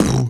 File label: Human Perc